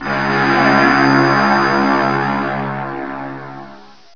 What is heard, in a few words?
scary